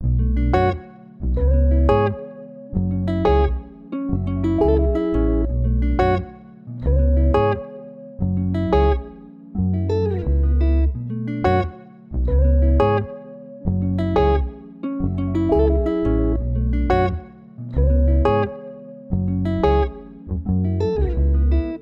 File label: 88 BPM F# Min, Melody Loops Afrobeat; Daiquiri